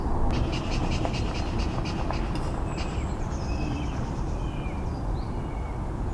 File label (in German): Zwergdommel; Echinger Stausee LA